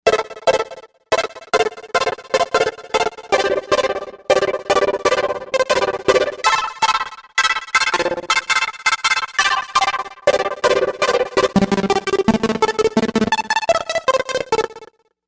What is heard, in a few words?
Stutter, Novation UltraNova; Factory Patches; Stutter Console